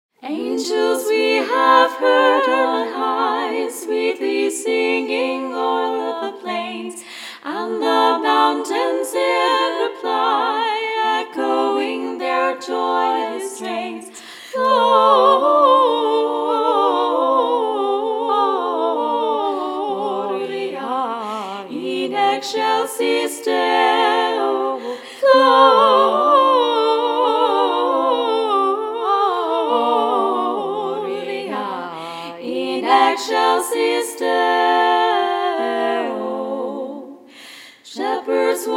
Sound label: Christmas song unfiltered